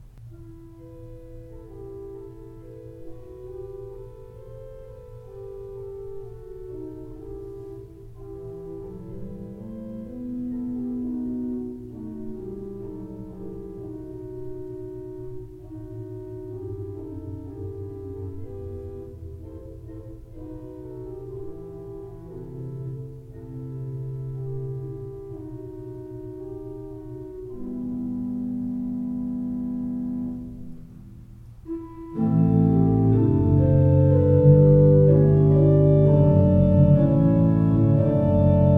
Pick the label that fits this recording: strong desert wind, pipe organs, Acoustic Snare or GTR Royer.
pipe organs